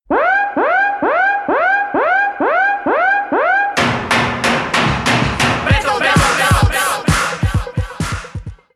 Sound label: Siren